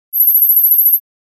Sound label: Swamp animal